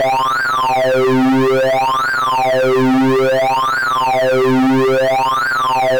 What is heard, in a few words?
square sync lfo; Hardsync; bez unisona